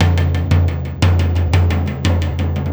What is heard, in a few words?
Tom Particle, Processed Drumkits; Zero Gravity Beats; Particles